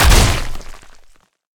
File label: prick